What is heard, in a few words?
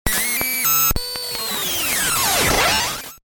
Satellite